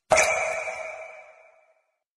Water Drops

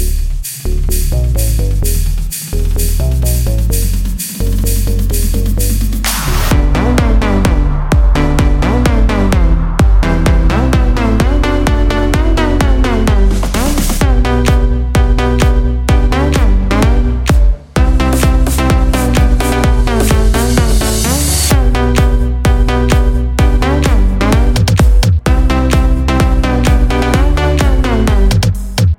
navidad house

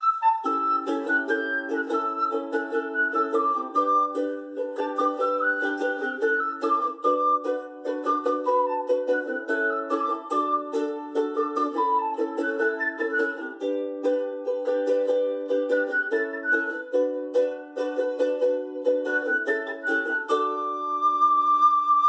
cover ukelele

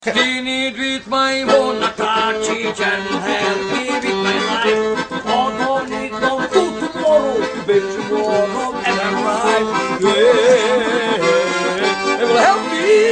Harmonika

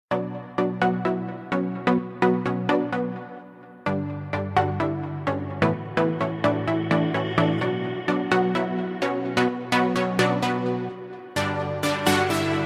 Rave Beat

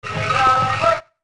Chant; Odolwa

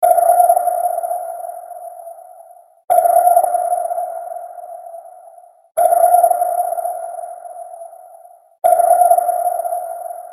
sonar sound

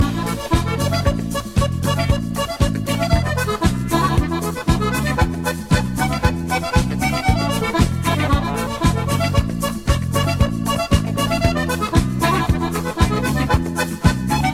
Disco Akordeon

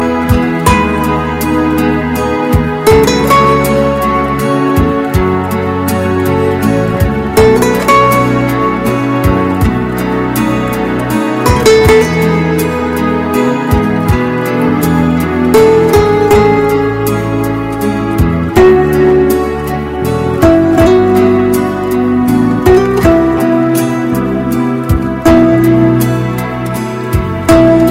Nice Guitar Tone

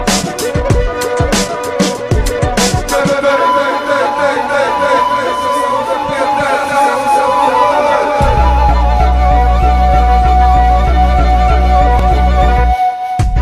Romanian Folclor

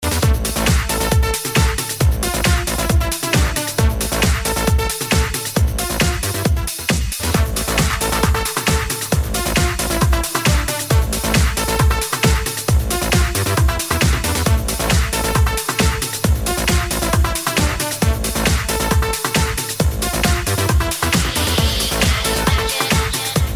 Electrohouse

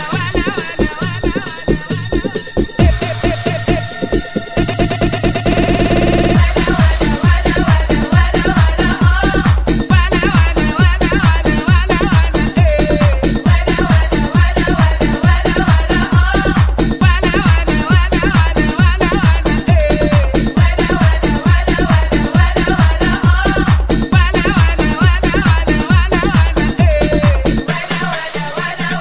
Oriental Dance

